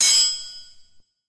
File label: swords